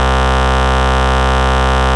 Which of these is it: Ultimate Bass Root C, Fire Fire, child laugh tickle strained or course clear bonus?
Ultimate Bass Root C